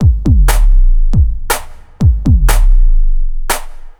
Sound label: Urban Beat, Drums